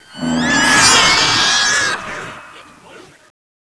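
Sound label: zombie death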